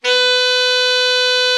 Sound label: alto sax; Best Service; Killer Horns